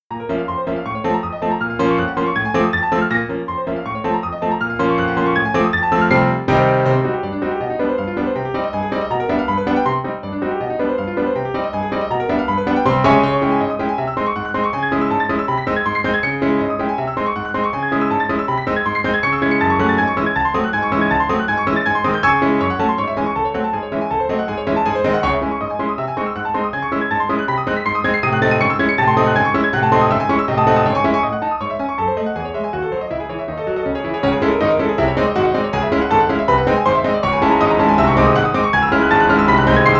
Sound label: Scalar Etude